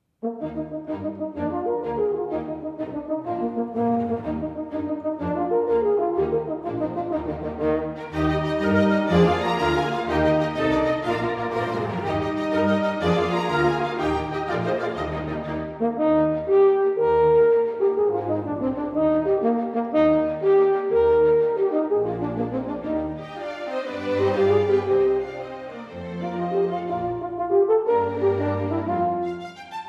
Horn Concerto, Rondo; Mozart

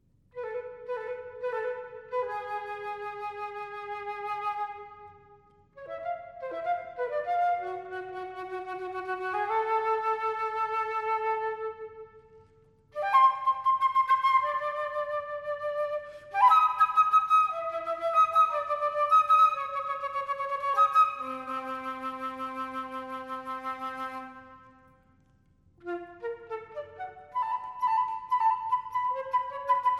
Pieces For Flute Alone, Scherzando

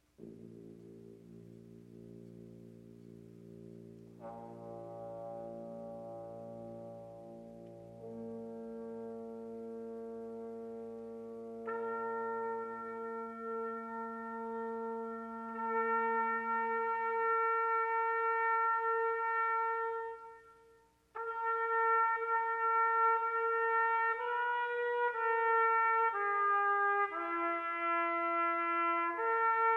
Suite For Brass Quintet, Chorale

